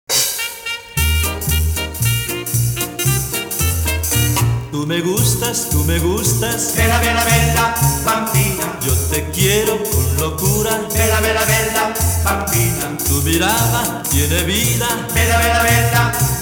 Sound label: latin quartet